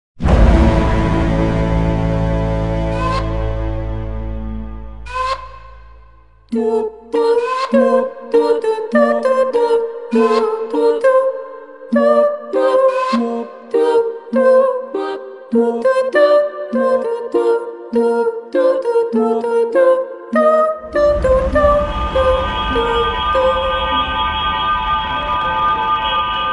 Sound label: Turret Opera A Cappella; Portal 2